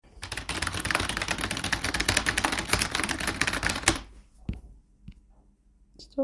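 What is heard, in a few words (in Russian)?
звук быстропечатающей клавиатуры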